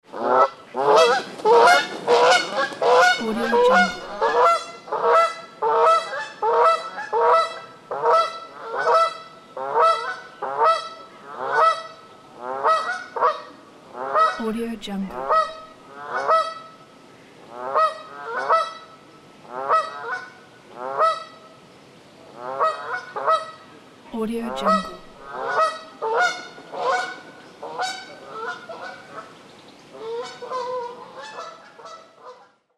geese

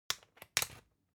object electronics usb port metallic in hard

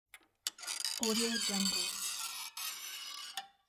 very squeaky door handle slow release

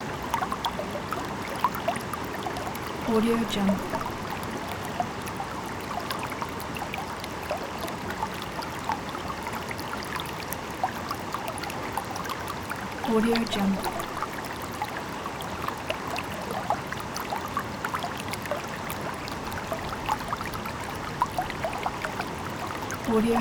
creek with close babble

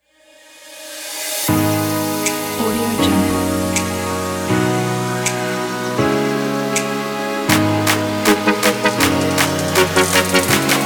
future bass upbeat opener

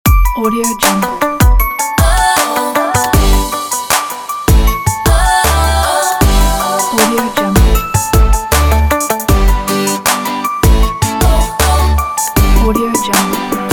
teen party ident

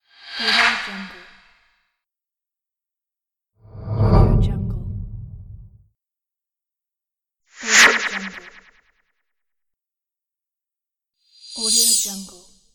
transitions ambience

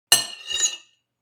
eat cutlery on plate scrape squeak; foley; smartsoundfx

